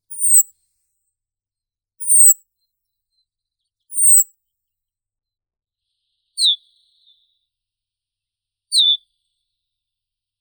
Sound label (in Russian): ovsyanka ogorodnaya emberiza cirlus